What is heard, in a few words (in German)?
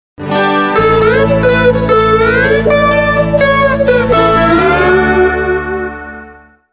Jingle; Neckermann Reisen